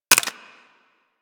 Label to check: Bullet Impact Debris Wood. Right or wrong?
Right